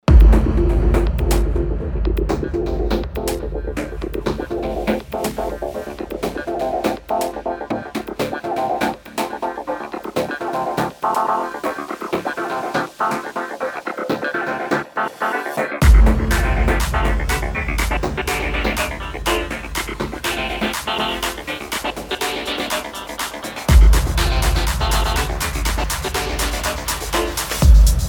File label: FUNK